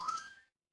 feedback success

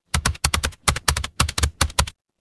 office keyboard

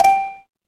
feedback merge

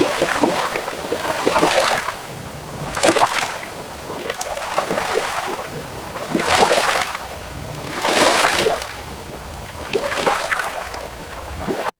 ambience ocean

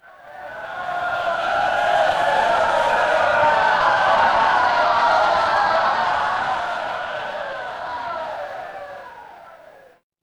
crowd cheer

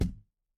impact thud

